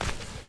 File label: walk, mon